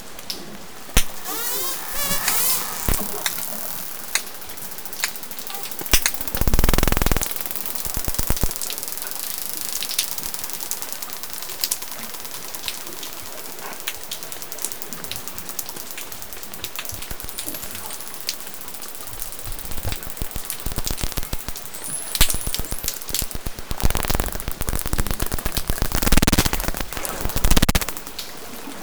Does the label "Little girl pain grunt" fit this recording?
No